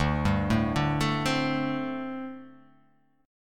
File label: D 7th Suspended 4th, down